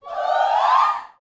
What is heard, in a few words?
SHOUTS, Gospel